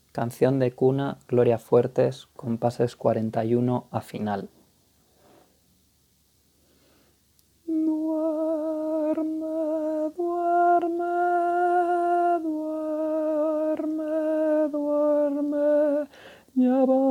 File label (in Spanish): Canción de cuna para niños Tenor; compas 41 hasta el final